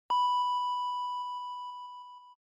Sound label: Time Signal Beep Short